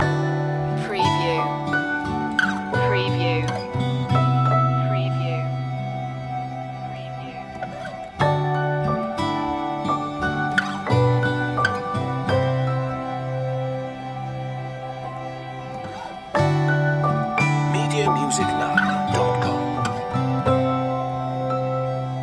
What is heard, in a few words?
rain reflections loop